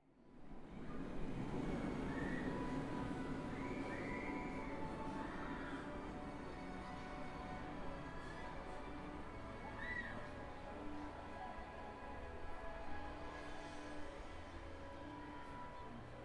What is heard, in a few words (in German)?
Blue Fire Kreuzung; Wodan